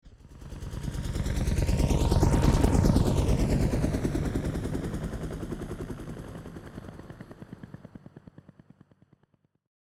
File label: Helicopter flying overhead pass by